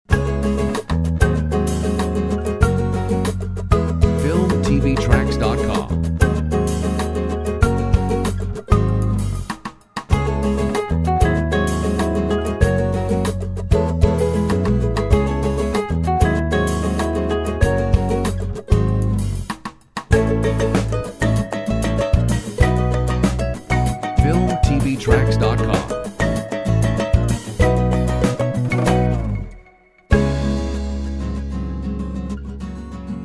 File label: Ukulele Latina; Filmtv-tracks